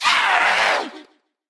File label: hurt evil